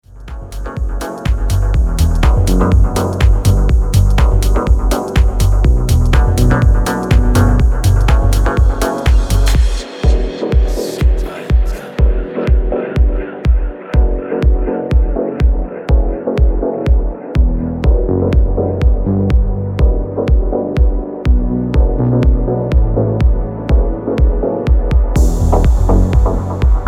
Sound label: Instrumental Dub